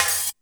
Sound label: SD, 170 BPM, Drum & Bass; Ueberschall; Drum & Bass Flash Trackz